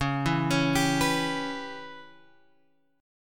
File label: C sharp Minor 7th Flat 5th C sharp Guitar Open G